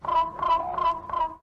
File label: quetzalcoatlus idle; paleotest